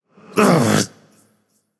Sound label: grumble; smite; vamana